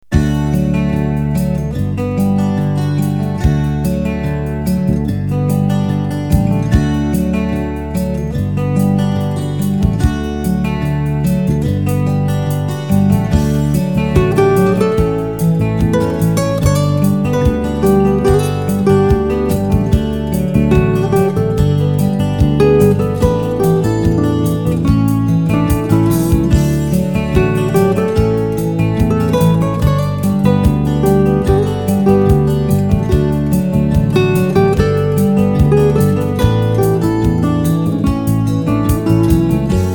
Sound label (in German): mit Bass und Schlagzeug